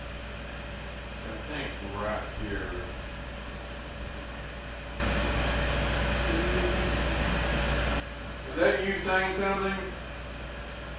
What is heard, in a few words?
responding to an unexplained moan